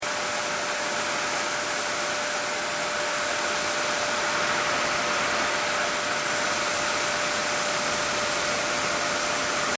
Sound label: Vacuum Cleaner Unknown Operating Loop; Household Appliances